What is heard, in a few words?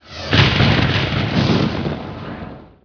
shrine; Heretic II Demo; items